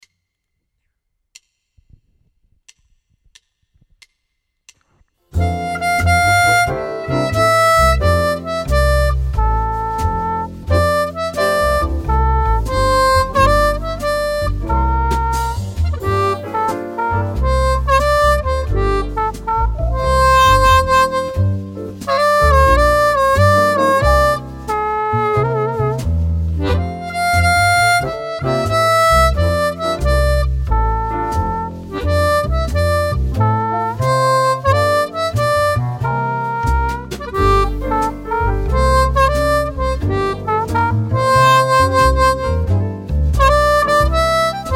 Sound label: 90 harp